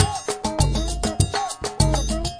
inner ethicity, drum loops and breaks, 100 bpm; 091 to 100 bpm

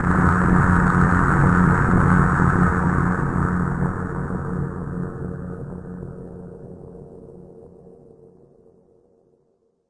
static buzz hit, synth

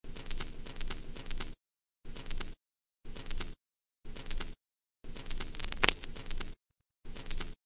minimal dirt spaces, looping ambience

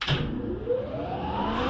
machinetool wind up; Weird and Interesting Hits; single hits

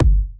kick

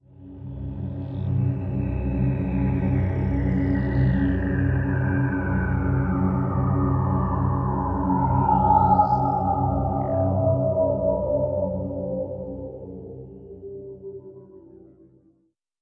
descending atmosphere, Pads

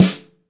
brass sd soft; single hits

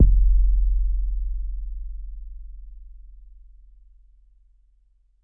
808 kick fat n low; Kicks; single hits

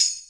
classic hh Tmb; STYLE KITS (Hip Hop, Etc); Classic Hip Hop Mega Kit

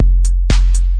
minimal tekkno, drum loops and breaks, 120 bpm

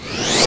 Electro FX; Ueb HE Electro FX

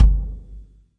real kick; REAL LIVE KITS; A Real Freakin Drum Kit

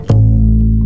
Upright Bass Notes

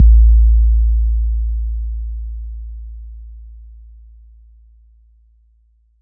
kick 808 Am long; Kicks; single hits

